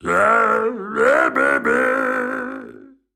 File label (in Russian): zvuk peniya